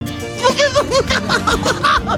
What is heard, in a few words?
Laughing; Salman Khan; Funny; Partner Movie Meme Templates